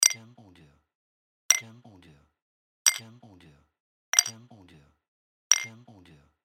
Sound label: Bottles Clinking